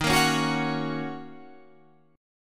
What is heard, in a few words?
Eb Minor 7th Eb Guitar Half Step Down